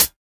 Raw Foot Hi Hat Sound A Key, hihat sound tuned to the A note